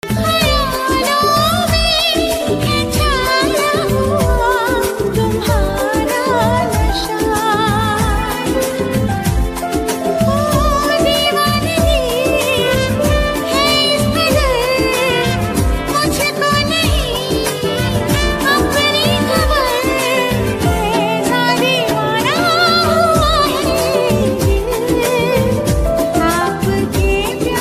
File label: Ringtone